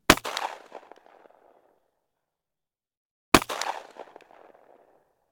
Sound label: gun mossberg; still north media